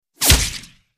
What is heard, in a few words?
Bullet Whiz Wet Body I